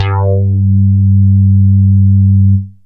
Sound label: synth bass